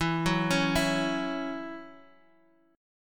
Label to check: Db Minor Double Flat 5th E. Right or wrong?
Right